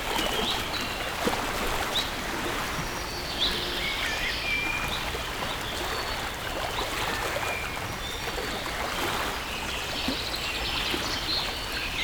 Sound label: ocean forest, ambiance